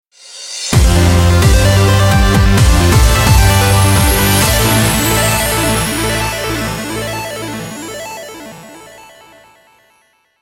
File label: Alert